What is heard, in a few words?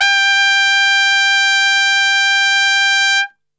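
G 3 trumpet straight, brass; Best Service; Brass Super Section; TRP STRGHT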